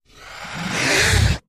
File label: Animal Stinger; stingers & whooshes